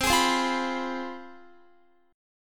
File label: C Major 7th Suspended 4th Sharp 5th C down Guitar; CM7sus4#5; strummed; 4E,4A,5D,5G,5C,6F